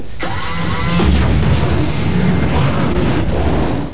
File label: wormhole open; cstrike